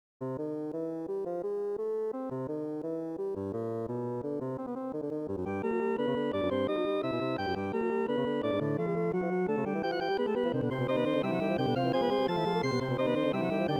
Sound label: Organ Pipe